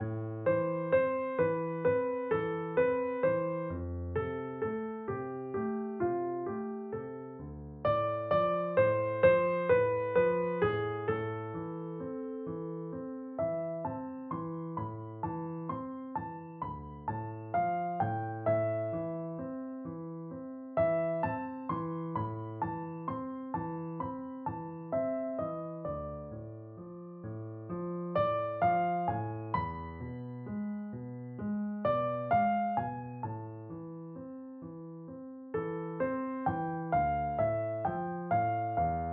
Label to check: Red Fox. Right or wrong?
Wrong